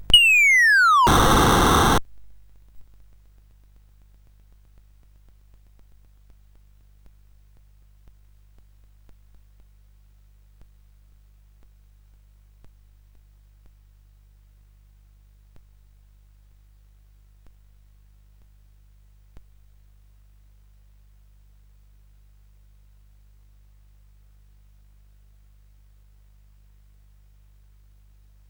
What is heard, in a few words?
Big Ben, CSG8580R5; GAMES